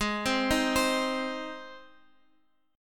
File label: G sharp Major, Guitar, Open F; arpeggiated; x,x,8,7,6,7; JGuitar